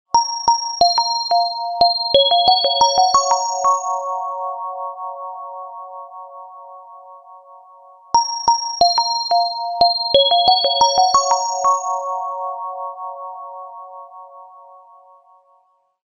wakeup will you song; wake-up-tones

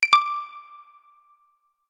graceful, message tones

